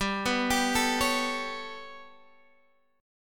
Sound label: B 7th Suspended 2nd Sharp 5th, chord